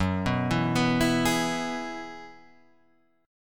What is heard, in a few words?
F sharp 7th Suspended 2nd Suspended 4th, Guitar, Standard; 2,2,2,4,5,4; arpeggiated